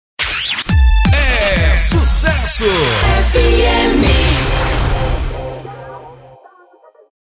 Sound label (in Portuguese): VINHETA; SUCESSO FM